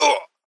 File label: hurt